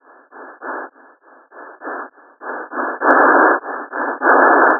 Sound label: loud